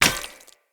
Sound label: Sphere Polearm Medium Impact Rock; Player; Environment